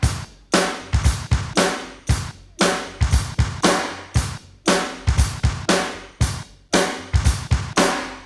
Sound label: Verse Drum Guide; Multitrack; Remix Parts